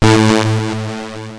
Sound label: square echo